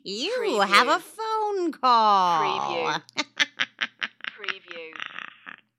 Witch Ring Tone

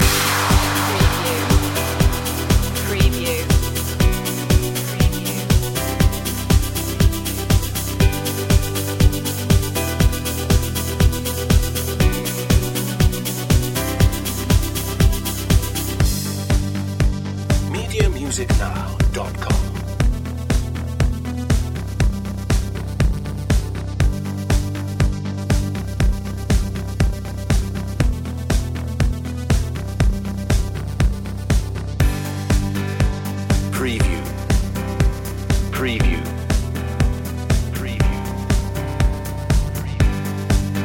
rise above

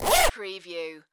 Zipper

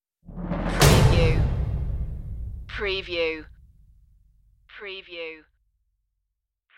megadrums stinger

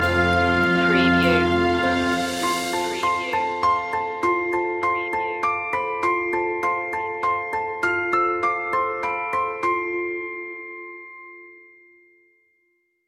away in a manger orchestral loop end; media music now

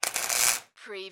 Arc Welder Short Burst

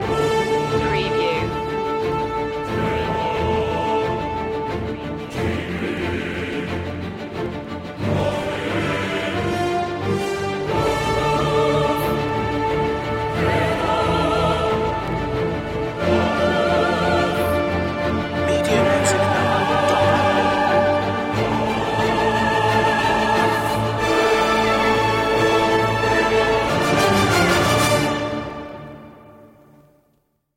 stormbloods no perc

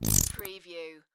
Squeezy Honey Bottle; beanstalk audio

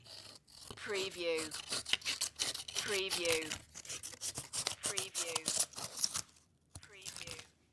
scissors multiple snips

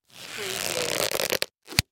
Latex Glove Stretch; Sound Effects Bible

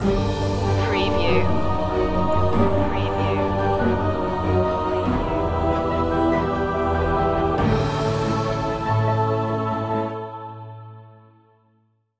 magical winds stinger

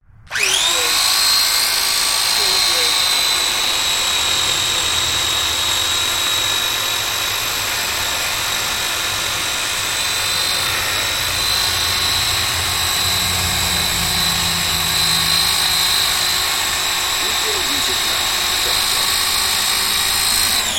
Chainsaw

